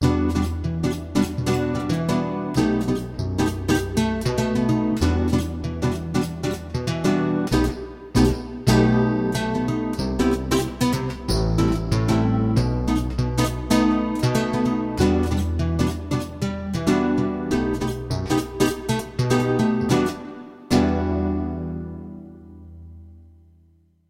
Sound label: Acoustic Pick Guitar